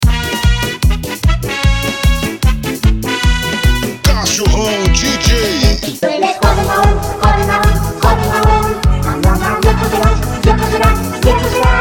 remix

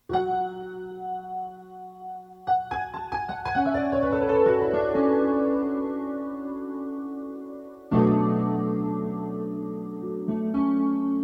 piano metal

